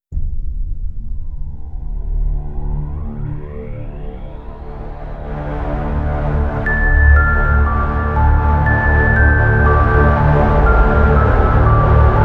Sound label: Zombie Side Stinger; Zombie DLC5